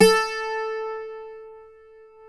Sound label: A3, Greek Bouzouki; NorthStar - Global Instruments VOL-1